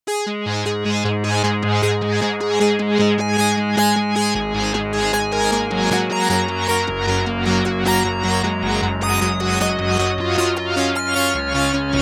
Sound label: reso arp